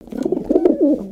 snore

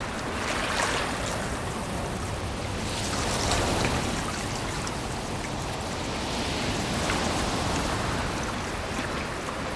close ocean

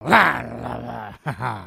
Shoot Complete; creatures organic

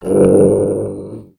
Skullkin Idle VO; creatures organic

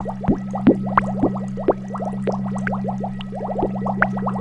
science tube, ambience

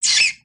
Pet Bunny Beg; creatures pets; Lego

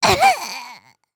Die; creatures organic; Lego; Strombie

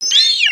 Approval, creatures pets

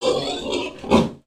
Pets Warthog Happy; creatures; Lego

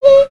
Owl Hit; Valiant; Summoner; aronwk collection; ndaudio

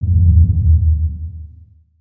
Cave Ground Rumbles; ninjago

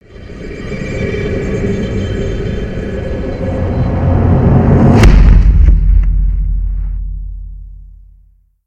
Binocular Path, ambience gnarled forest

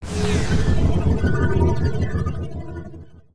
Spiderling Charge Up, creatures mechanical; Valiant Spiderling

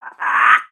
Pets Skunk Angry; creatures; Lego

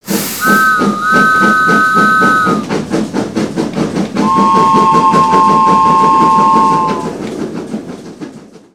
Train Booster Full; aronwk collection; ndaudio

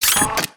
Broombot Idle Thick, creatures mechanical; Inventor Broombot

